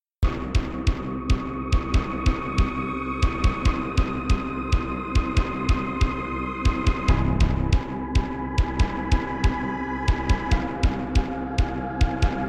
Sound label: Korg X5DR; sigil doom episode 5